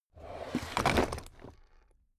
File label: drawer cabinet stuff open regular